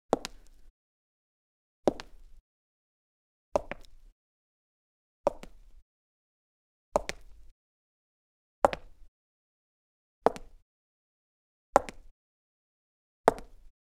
Footsteps, Cement Heels Boots

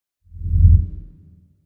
Whoosh Transition; Whooshes

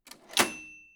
Typewriter Bell

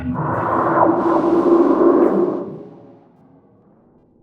Break Down, Abstract Foleys

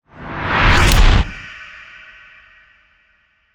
Tension Builder Impact

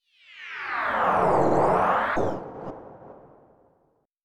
Fly By Fluctation Spaceship

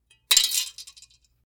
Metal Rattling

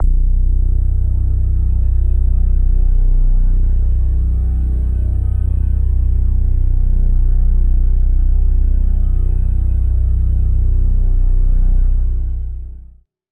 Drone; Drones

